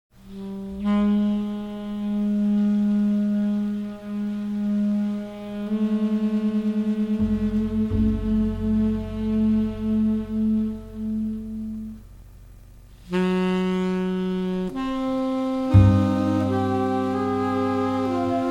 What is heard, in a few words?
Improvisation